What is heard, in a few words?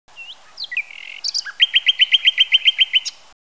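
nightingale; jap; cs hokkaido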